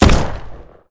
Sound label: model94, guns